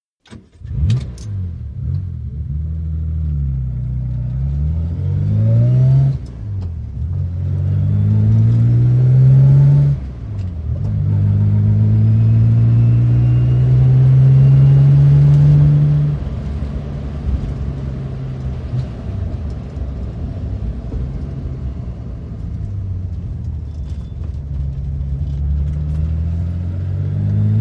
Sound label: Aston Martin OB Stdy R, int.